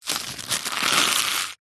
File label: foley leaf dry pick up from concrete squeeze crush; zapsplat